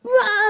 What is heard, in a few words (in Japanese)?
"うわー！"; INFINITE UNDISCOVERY; ルゥ